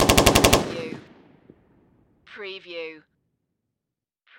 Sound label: Machine Gun M16